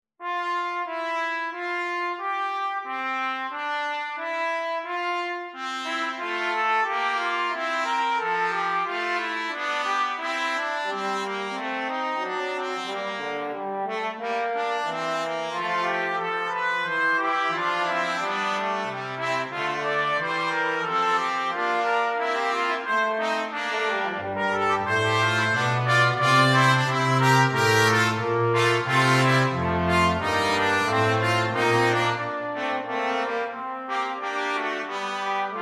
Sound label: fugue in f, midi